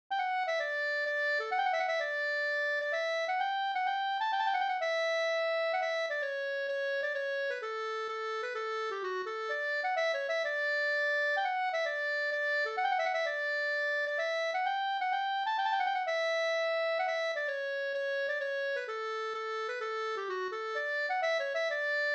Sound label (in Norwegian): pols; Leken hinnes Karolina Viklund e Olav Nyhus e Sven Nyhus no